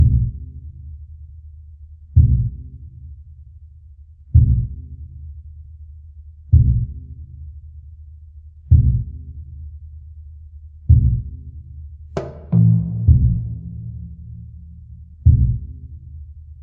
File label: Jungle Level